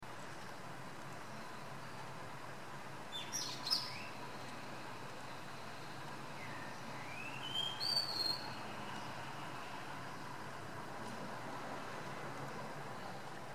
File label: Wallcreeper Tichodroma muraria; Birds of Ladakh